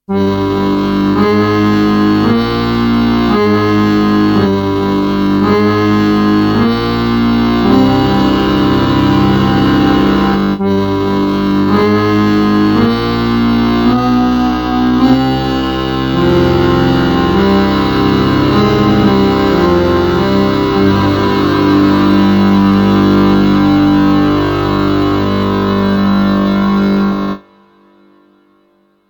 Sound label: mk2 accordion bass notes